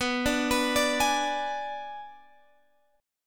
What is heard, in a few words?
B Minor 6th B, Guitar Open G